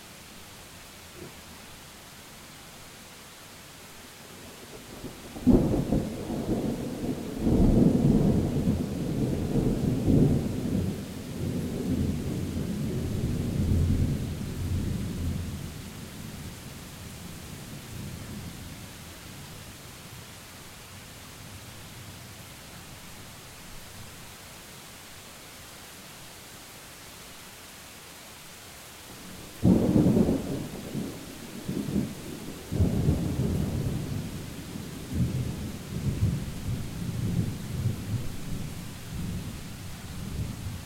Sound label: rain; civ13; civ14; Civ Ambience